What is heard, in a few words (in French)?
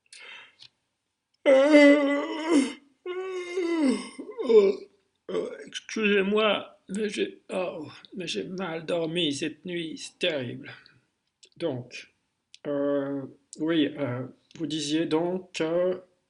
baillement mal dormi; itslenny